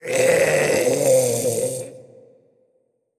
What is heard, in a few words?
Mummy Death